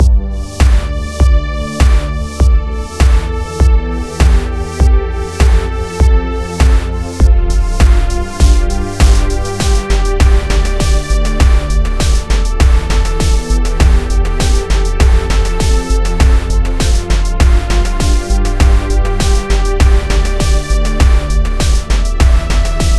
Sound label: tune